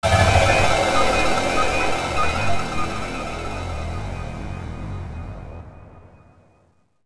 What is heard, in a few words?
Sting; Stronghold